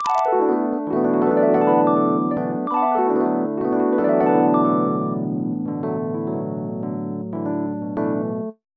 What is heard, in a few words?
Harp Sound